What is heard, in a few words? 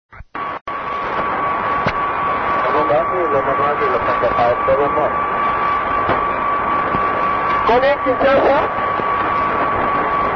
aero 8913 kHz; kinshassha aero 8913 khz 2107z